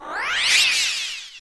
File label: Kamek Fly Away